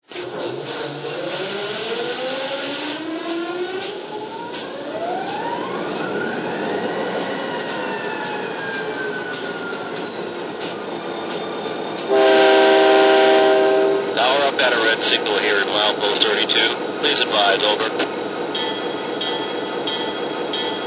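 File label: SD45, locomotive; MRL; BNP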